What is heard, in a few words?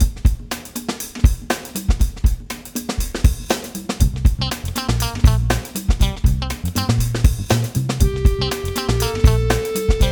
Blues